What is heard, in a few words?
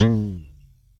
pluck; crowds; NotDotTeam